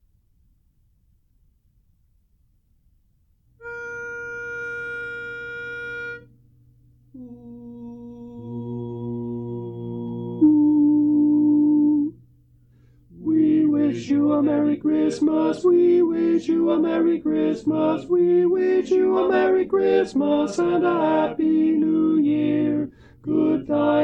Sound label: We Wish You A Merry Christmas, Tenor